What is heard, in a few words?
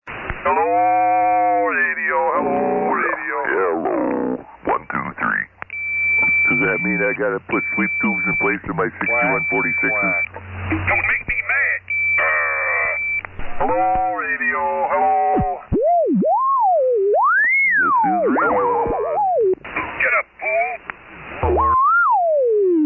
SSB Net Tune Up